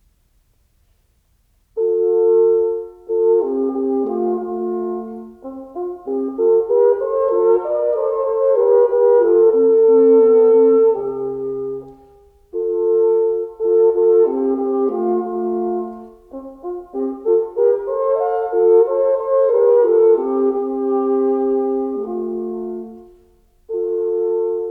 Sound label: Duos for 2 Horns, Larghetto; Wolfgang Amadeus Mozart; Divertimenti; Serenades and Divertimenti for Wind; 12 Duos For 2 Horns, K.487